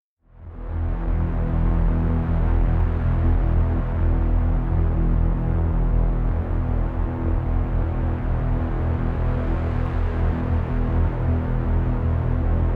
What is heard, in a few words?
Bell